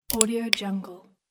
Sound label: tick